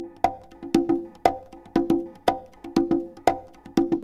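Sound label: CONGA LOOP; Techno Trance; E-MU Formula 4000 Series Vol. 2; Combo 4 4000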